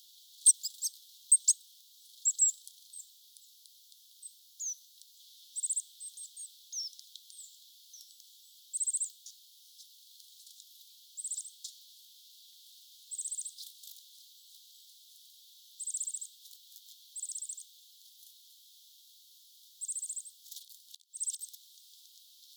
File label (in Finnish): kuusitiaisen innokkaita ääntelyjä kooste